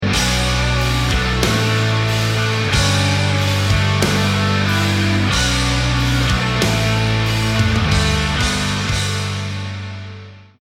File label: round ten sec count, music